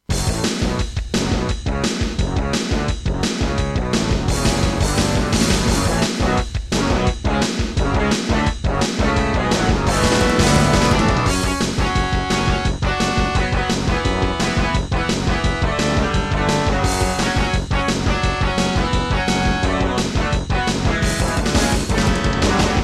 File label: Intro, Qwave; Serdaco; Duke Nukem 3D